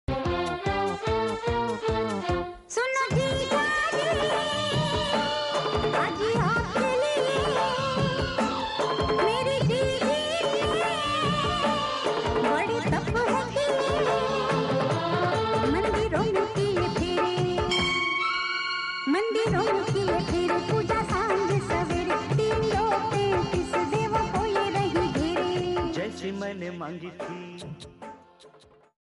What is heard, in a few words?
movie song; hum aapke hain koun movie song